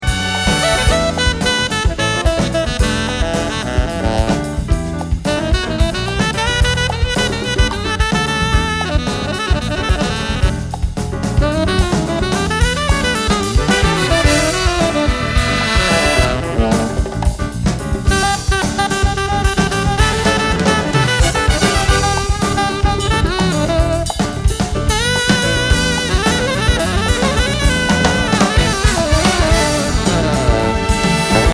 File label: Jazz